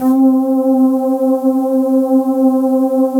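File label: PAD, atmospheric pads; Best Service; XX Large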